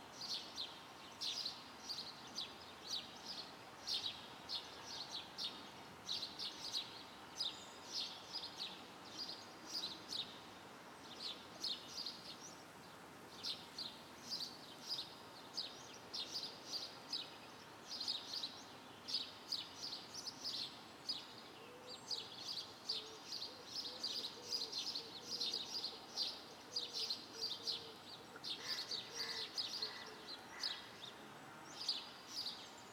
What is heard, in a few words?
Exterior Ambience; Planetfire; Bedlam